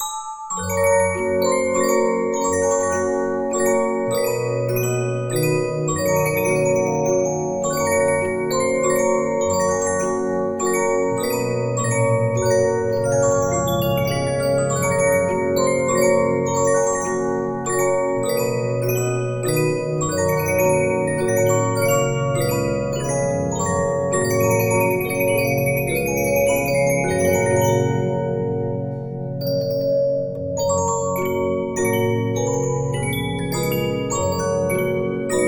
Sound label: Regina, 15inch disc musicbox